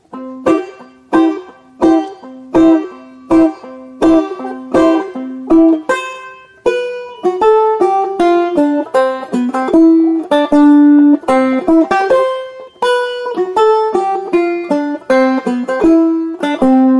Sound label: Wedding March, No Organ; Trad (PD)